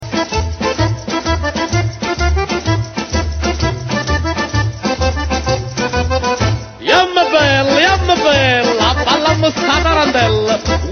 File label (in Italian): TARANTELLA